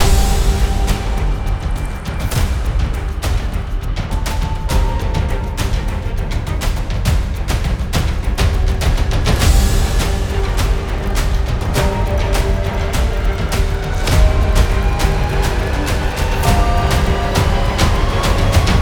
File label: Film Trailer